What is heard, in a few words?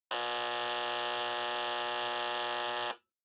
Flip Clock Buzzer 60Hz